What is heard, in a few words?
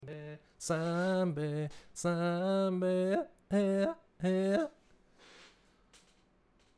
Yodel male